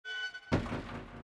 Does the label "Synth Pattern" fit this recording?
No